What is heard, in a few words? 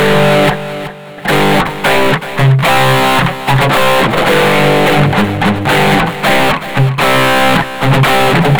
Guitar Riff Gm C